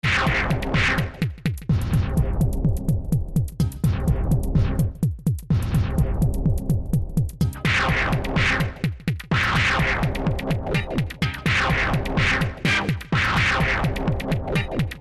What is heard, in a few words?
Techno